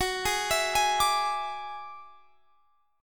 F sharp 7th Suspended 2nd Sharp 5th, Guitar, Standard; F#7sus2#5 arpeggiated